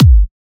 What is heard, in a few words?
Acoustic Kick